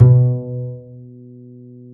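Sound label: double bass B2; Univers Sons; Jazzistic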